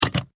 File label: transportation car seat belt click lock; Vehicles; McKinney Sound